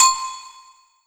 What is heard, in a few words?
Agogo Low, Percussion; realdrum